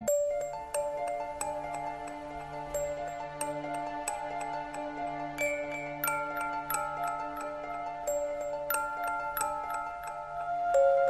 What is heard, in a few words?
mysterious forest, halloween